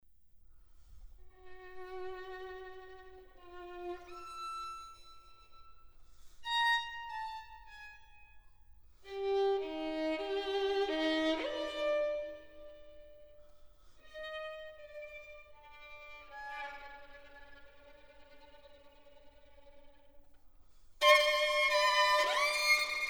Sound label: Partita For Violin Solo, Postludium